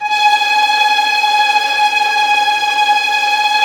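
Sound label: strings, Vlns Tremelo; Vls Trem wh%; Roland L-CD702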